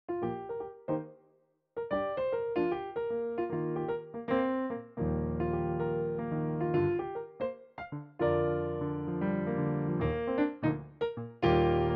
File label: Piano Solo